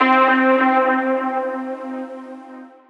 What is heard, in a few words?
synth note analog and dense C; Virtual Riot; Synth Notes & Chords